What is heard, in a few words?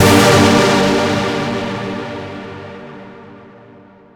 Tense Keys, Drum n Bass; KJ Sawka; Drum n Bass & Beyond